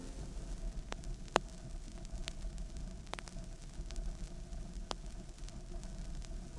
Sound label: Crackle, Vinyl Noise